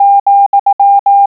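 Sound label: COMMA, morse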